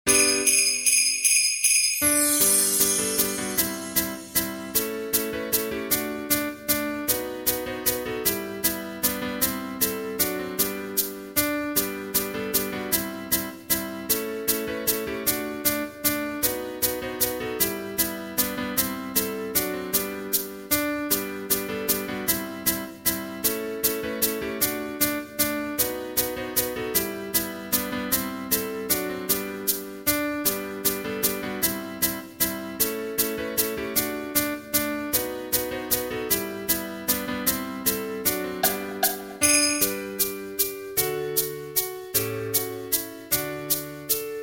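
We Wish You A Merry Christmas neutral accompaniment; Bass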